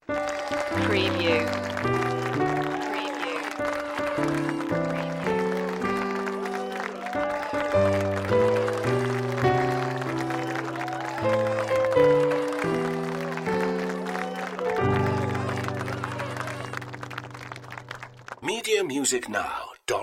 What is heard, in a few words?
happy birthday piano easy applause